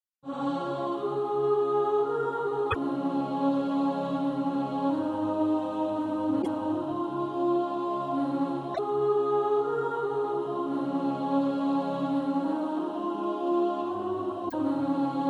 Jesus paid it all sop

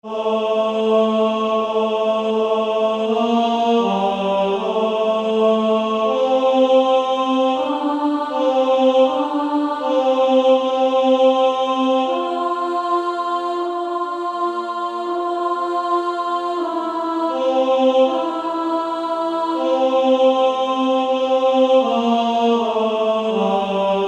Female Choir

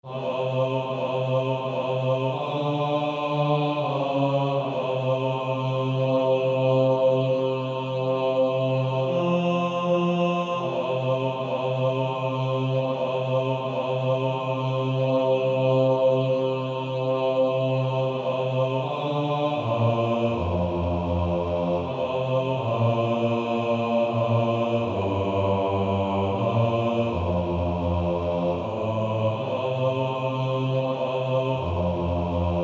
Male Choir; Bass

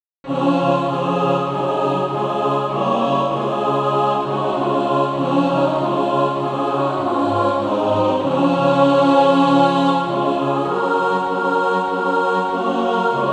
mixed

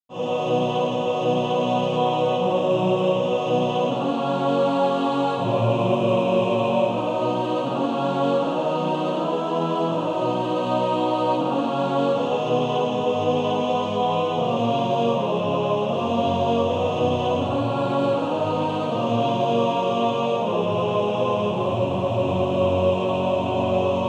Male Choir TTBB

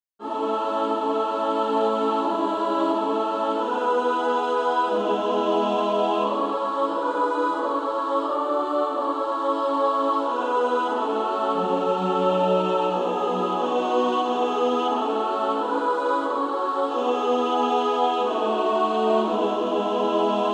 Female Choir

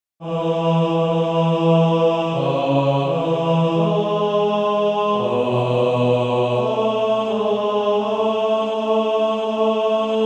Male Choir

